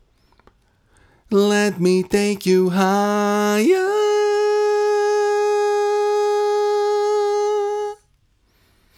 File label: loop